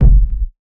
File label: reverb bass drum one shot f key